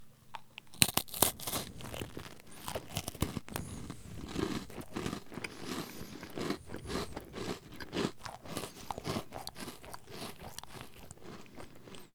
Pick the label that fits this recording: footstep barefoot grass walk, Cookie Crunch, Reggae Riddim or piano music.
Cookie Crunch